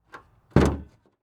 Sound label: Chevy Trailblazer Open Hood; transportation